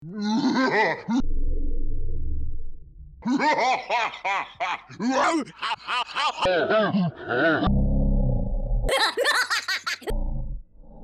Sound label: noise